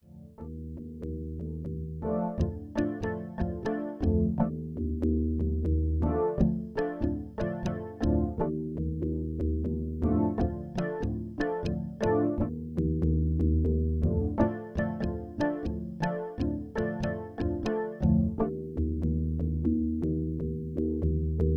Rossum Electro Music Morpheus Tam to Bell Wah Weird